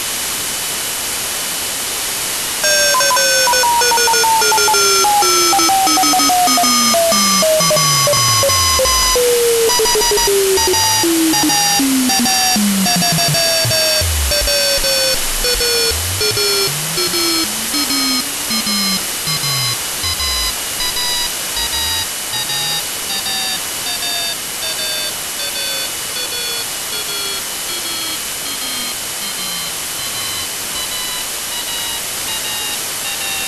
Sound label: CW; CSUNSat1